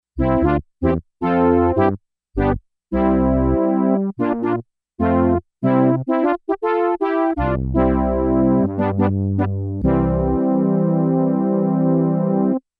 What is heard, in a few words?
Yamaha DX11 Rich Horns; Factory Performance Voices